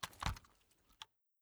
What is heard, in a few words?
foley, weapons; mas scar; cw20 extras